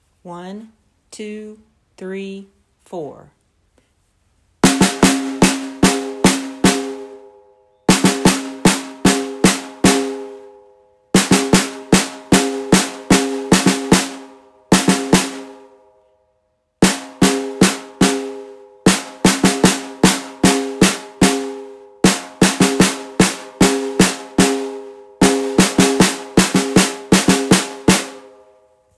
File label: percussion